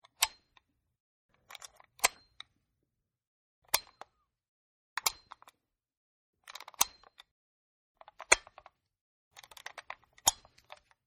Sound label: USB Key Pullout, Usb Key Being Pulled Out Of The Computer; Usb Key Being Pulled Out Of The Computer-7 Takes; Computers; Electronics